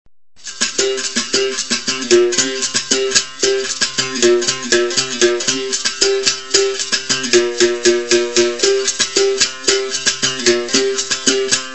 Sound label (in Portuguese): Toque de jogo de dentro, capoeira